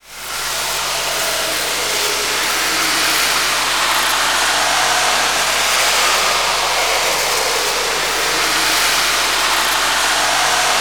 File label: JUNGLE; Sound Gallery - Stack Ware